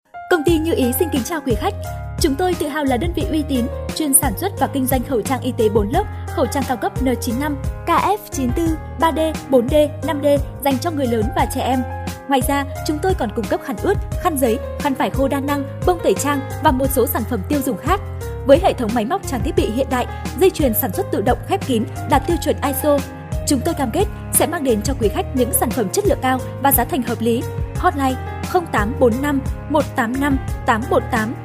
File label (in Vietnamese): Nhac cho